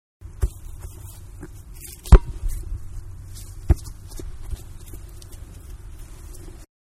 Soccer Ball drops to ground, Soccer-Ball Drops To Ground, Then Is Kicked; Manhattan Production Music